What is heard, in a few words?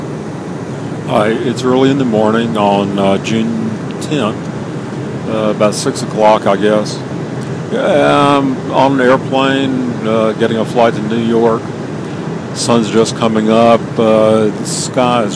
early morning in flight